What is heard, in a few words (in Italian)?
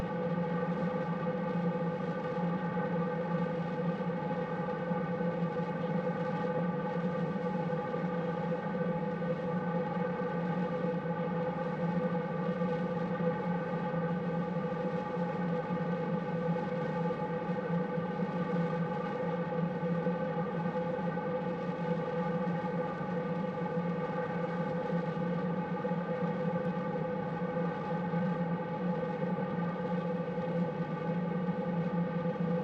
Rumore Fondo; CESI; LaSpezia